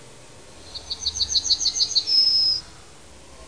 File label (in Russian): ovsyanka emberiza citrinella